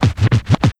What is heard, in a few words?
Sound FX Scratch